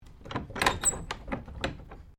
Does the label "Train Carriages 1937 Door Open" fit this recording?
Yes